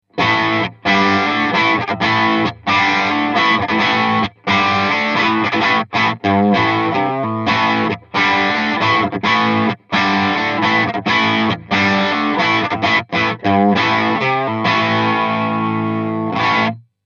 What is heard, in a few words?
Crunch; studio raw